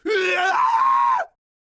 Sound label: scream; htf flippy